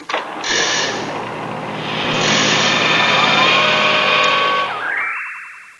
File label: Repair Building 8Bit